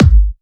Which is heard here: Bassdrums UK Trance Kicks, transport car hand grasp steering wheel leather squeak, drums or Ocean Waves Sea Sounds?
Bassdrums UK Trance Kicks